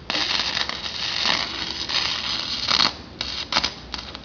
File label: welding